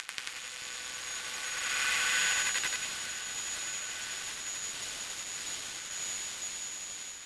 Noiz; Dance; SFX

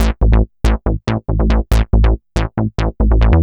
bassline, dance; Basses

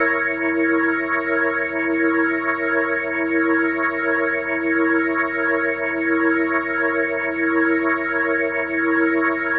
Organ F#7; Dominant 7; The Vitale Organ

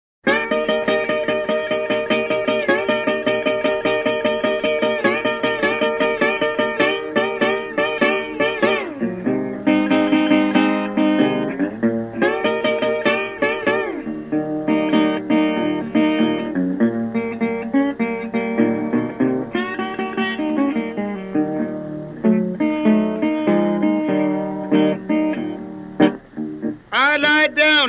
Slide Guitar, Homesick & lonesome blues

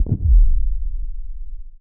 Sub Hit; Dance; SFX

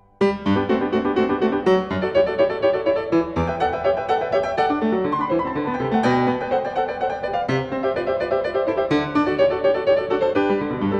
Piano; JS Bach; Goldberg Variations; Variatio 29 a 1 ovvero 2 Clav.

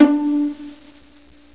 VIOLA D; MUSIC; INSTRMNT

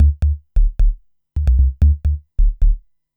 bass, dance

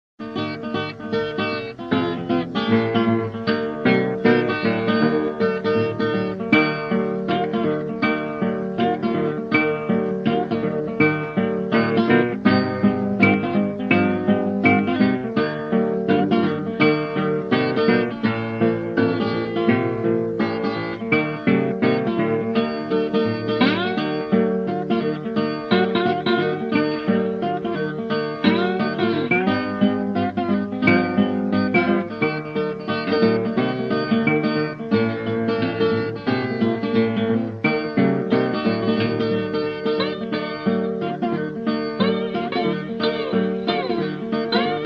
Slide Guitar - Bottleneck blues; Jazz & Rhythm & Blues

